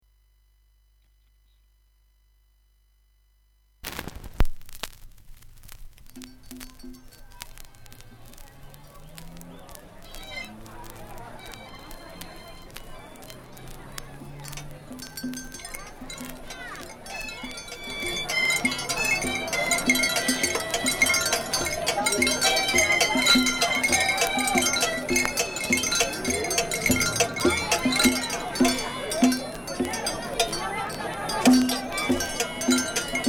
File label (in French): Homme orchestre l'Internationale